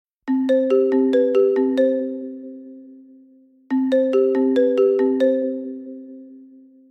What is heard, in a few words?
connecting; crush-level-web